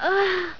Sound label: moan